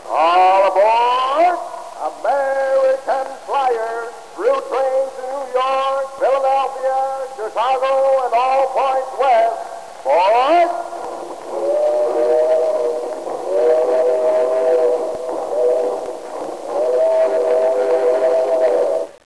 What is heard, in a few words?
station, trains